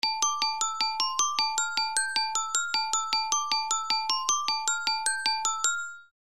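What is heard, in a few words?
orchestral mallet glockenspiel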